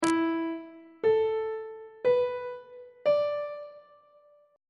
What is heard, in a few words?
d double sharp dominant 7th suspended 4th chord intervals on treble clef